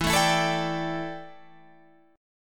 D Suspended 2nd Suspended 4th E

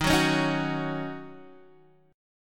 D sharp Minor 7th, Guitar, DADGAD

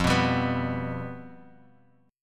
F Suspended 2nd Flat 5th, Guitar, Drop D

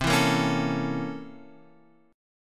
C 13th Flat 9th C

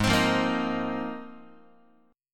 G sharp 6th Add 9th G sharp Guitar; strummed; JGuitar

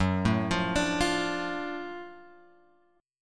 F sharp Minor Major 7th Sharp 5th; F#mM7#5 Chord {2 0 3 X 3 1} arpeggiated

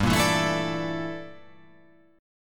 G Minor 6th Add 9th G down

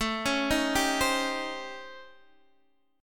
A 7th Sharp 9th Flat 5th, Guitar, Open D; x,12,11,9,10,10

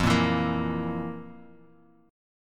F Major 7th Suspended 4th, Guitar, Open F; strummed

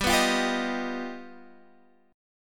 G Augmented 7th, Guitar, Standard; G+7 strummed; x,10,9,10,8,11; JGuitar

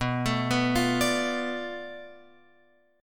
B-Minor 7th Flat 5th B down Guitar Open F; arpeggiated

